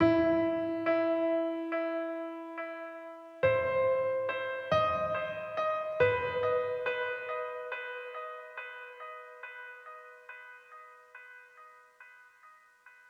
One shot Piano

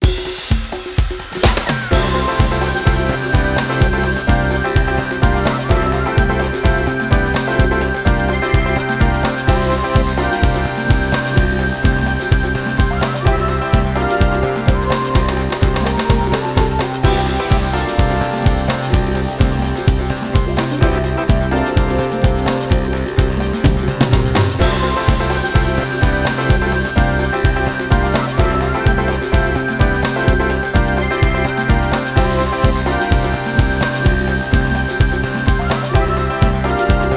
Target - Menu; Super Monkey Ball 2

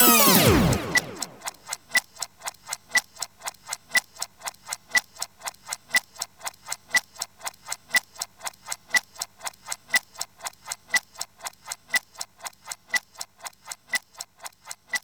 Jingle stop Rev; Blinx 2 Masters of Time and Space; Xbox

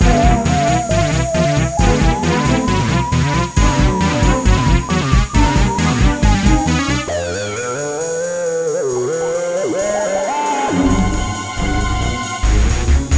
Flying Imps Loop; Plants vs. Zombies Battle for Neighborville

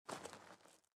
step gravel

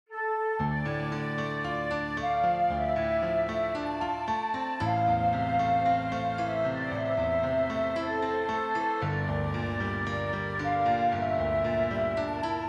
main-menu

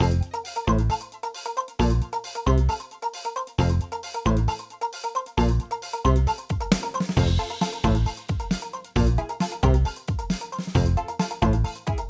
Battle Win

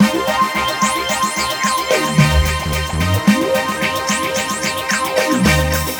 Disco TransitionFX

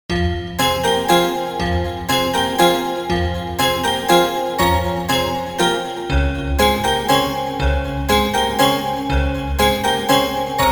Zither; super zangyura

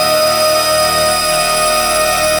Weapons; SFX Weapons Linkgun

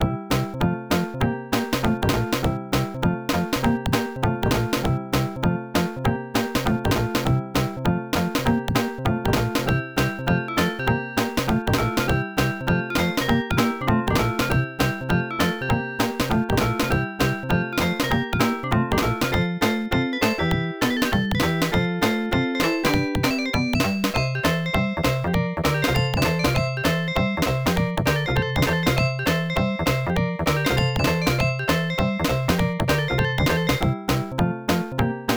Drum Solo; Jelly Boy; Genesis

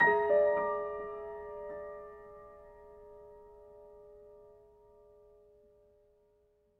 Jingle Piano

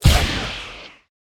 Pickup Shoot Out

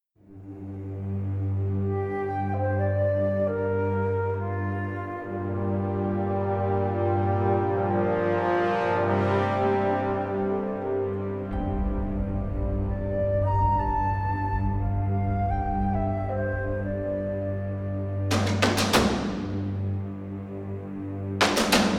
Ambient Loop; Extracurricular; rwby grimm eclipse

